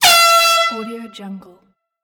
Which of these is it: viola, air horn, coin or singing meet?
air horn